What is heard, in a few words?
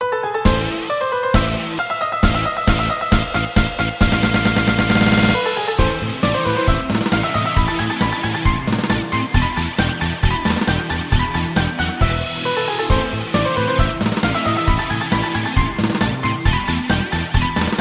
Turkish March